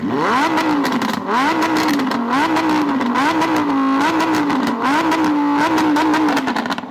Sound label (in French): brrrrrrrr voiture